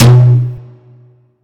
Roomy Tom One Shot B Key, Royality free tome drum sample tuned to the B note